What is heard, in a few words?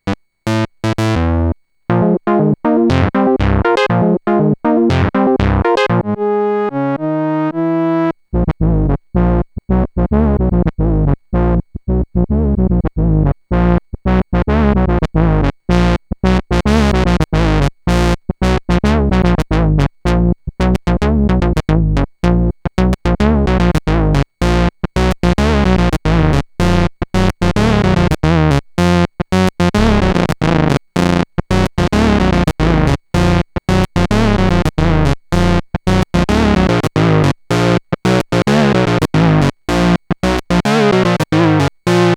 monologue jam harmony